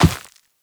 Operator Run Dirt, Footsteps; Warframe

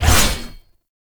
Blade Swipe; Warframe; Dagath Blade And Whip

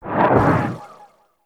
Cloud Augment Exit; Warframe; Monkey King

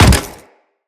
Step Default; Grineer Leto

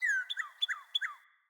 Cardinal Male Bird Song

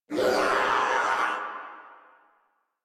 death crewmen; Dialog; Barks; Battle; Warframe; Corpus Crewmen; Crewman B

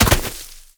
Bird Of Prey Bodyfall Grass; Hunting Critters

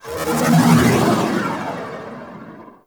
Shield Random; Warframe; Archwing; ArchwingShield

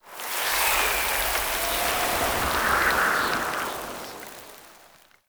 Death Dissipate; Enemies; Gameplay; Boss; Warframe; Oraxia

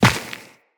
Run Dirt, Footsteps; Characters; Tenno

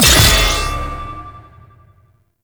Heavy Block Ability Cast; Warframe; Duviri; Dax Heavy; Abilities

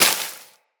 Bullet Impact Debris Grass; Weapons

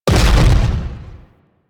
Railgun Explosion; Warframe; Corpus; Corpus Railjack; Biped Railgun

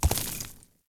Horse Surface Kickup Dirt; Warframe; Duviri Horse; Vehicles

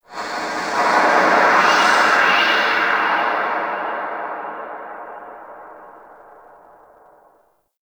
Wind Pipe One Off; Ambience; Dante Unbound; Warframe; Entrati; Dead End Secret

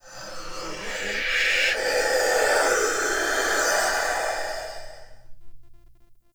Binaural Whispers; Warframe; Shadowgrapher

